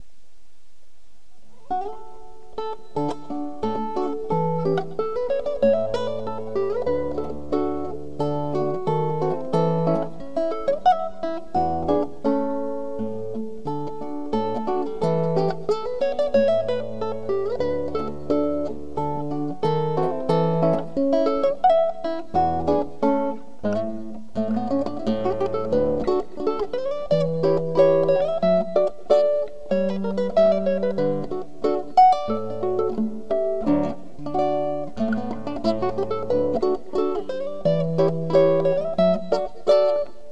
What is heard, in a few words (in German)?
polka